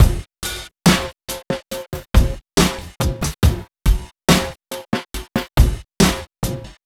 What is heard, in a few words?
Slomo Beat 140 BPM, Loops, Slow Motion Beats; Vengeance Samples